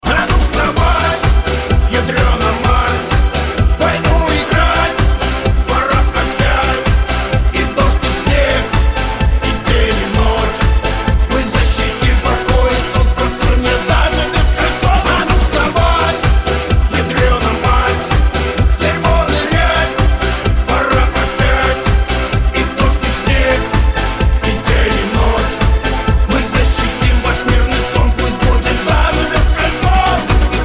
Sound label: ring